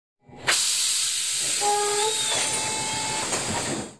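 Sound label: Door Close, Train; OpenBVE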